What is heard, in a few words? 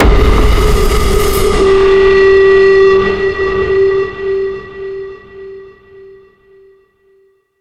gameover; It Came From Space and Ate Our Brains